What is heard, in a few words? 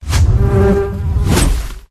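wasp block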